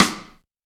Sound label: Loud Snare Drum Sample G Key, acoustic snare sample tuned to the G note; snare drum samples